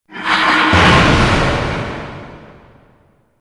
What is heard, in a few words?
cheat death; csgo; wcs go